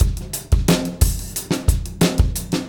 089, Beat Loops; Zero-G - Monster Beats

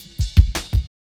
Cymbal Steppa Cut, Drum Loops 165.5; Zero-G Jungle Warfare 1, 2 & 3

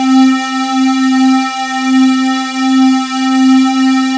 MKS RAW SQU, LEAD; AKAI S-Series CD-ROM Sound Library VOL-8; S-LEAD

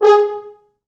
4 FrHorns; G#3; East West; Quantum Leap Horns Sax; 4 FrHorns MOD

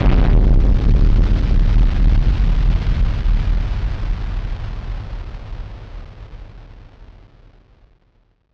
Drum Bomb; musicradar; bomb factory; Drum Bombs

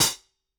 Solid Bruiser, Hats; Airwindows

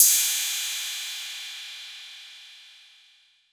PERC 808

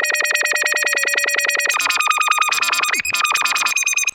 Odd Modular; radiosonics

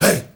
HEY HEY

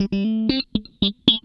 FAZE PLUK, Funk Guitar; 90 s Sample CDs; Zero G

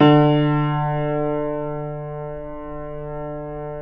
D2, Keyboards Acoustic; USB Soundscan vol.17 - Keyboards Acoustic; AKAI

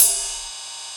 RIDE MONO; Ensoniq ZR-76

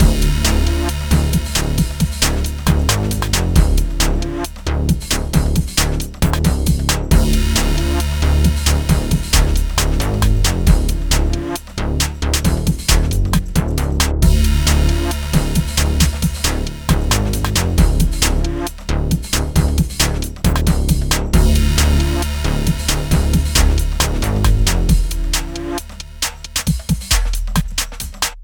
Hardcore Breakbeat, 135 Dmin; Big Fish Audio; Hardcore Breakbeat Action

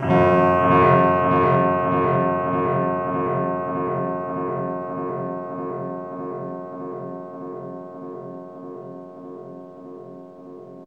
CLANGARA; AMG - Vince Clarke Lucky Bastard

